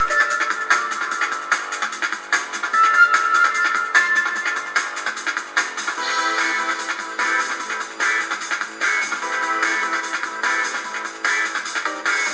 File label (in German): musik laut